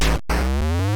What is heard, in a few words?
thud; Karate Champ